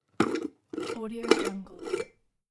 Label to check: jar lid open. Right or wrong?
Right